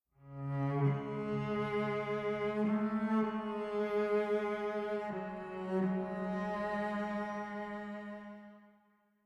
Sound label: Stinger Short, game soundtrack; Pillars of Eternity; Stinger Thaos Short Building Block 01